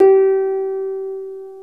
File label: HARP; Greytsounds; HARP+STEAMER